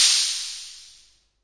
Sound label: vox cym, Vox AC1 RhythmVOX; drum machines